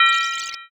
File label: vs card